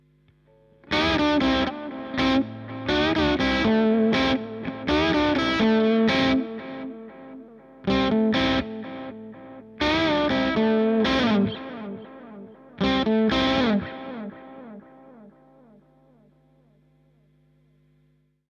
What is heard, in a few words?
delay tape; bogner alchemist